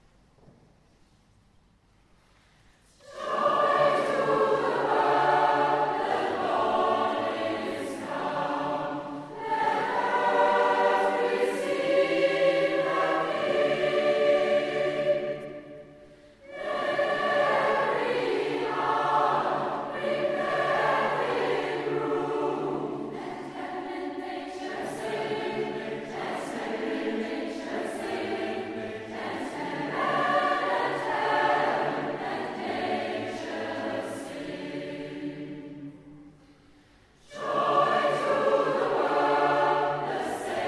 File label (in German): Chor und Jubilaeumschor